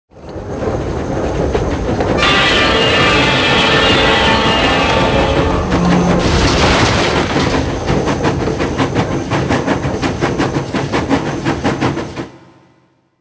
train; Soldier Of Fortune II Double Helix; XBOX

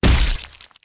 head explode, bullet impacts gore; Soldier Of Fortune II Double Helix; XBOX

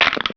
coke can break; Max Payne

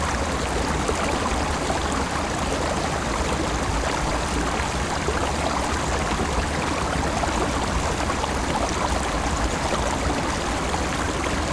Medium Stream Loop; Environment; BackgroundLoops

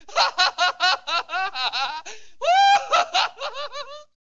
laugh; Virtual Pool Tournament Edition

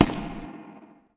step echo; people; Pirates Of The Caribbean

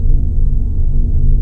kejim interior; ambience

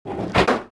emplaced dismount, weapons

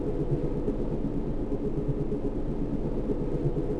conduit room ambience; Star Wars Obi Wan; XBOX

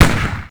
slider move; Max Payne

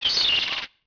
seagull; Ambient; MX vs ATV Unleashed

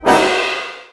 Hit

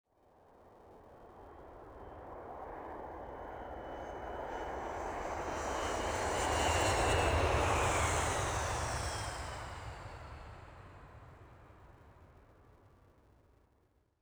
plane pass, airport; Soldier Of Fortune II Double Helix; XBOX; ambience

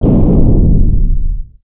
Drone Collision, Space

